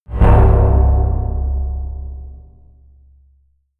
slightly distorted metal plate, metall; hits explosionen

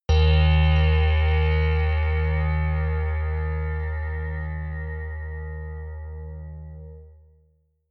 synth saw hit; whooshes rises

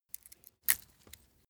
small metal crack soft; industrie wirtschaft; metall

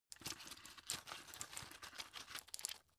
drug pull out shaky; berufe; arzt

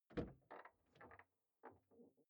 car door open exterior hummer h3

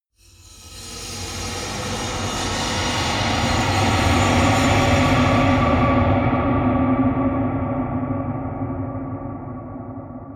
metal bright reverb; maschinen; soundpool

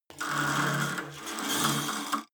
cable machine old pull release fast; sport freizeit

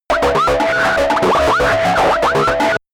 calculator low, roboter; comedy-cartoon; soundpool

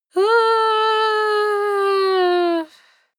female moan whine soft; koerpergeraeusche; menschen; soundpool

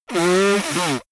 nose blow; comedy cartoon; koerpergeraeusche

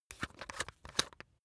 razor battery compartment screw open; maschinen; rasierer

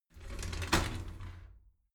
shower slide door inside open regular halfway

